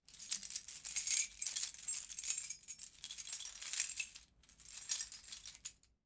keys

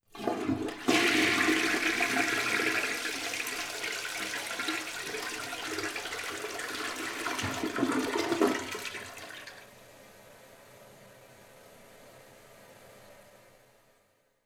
toilet flush

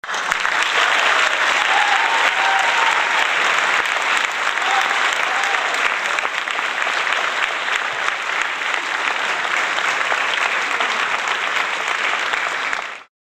applause

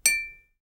cling

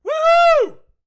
woohoo

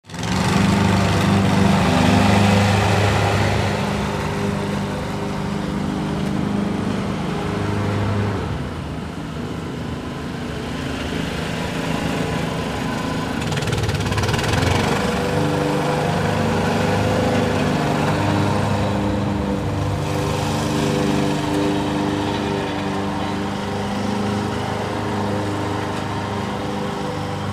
lawn mower, machine sound effects

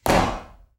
bang, mechanical sound effects

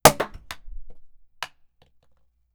champagne bottle pop

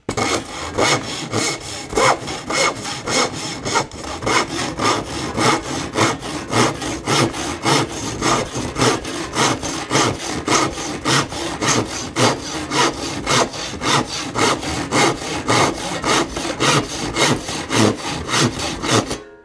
sawing, mechanical sound effects

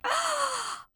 aaaah

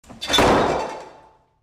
door close

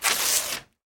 paper tearing

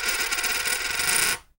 spinning coin

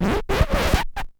record scratch

